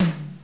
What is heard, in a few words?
tom